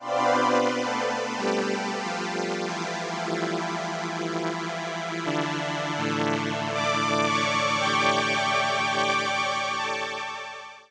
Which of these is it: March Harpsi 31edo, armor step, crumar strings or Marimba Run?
crumar strings